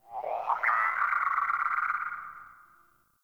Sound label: Ostron Village Birds One Off; Warframe; Eidolon Plains; Ambience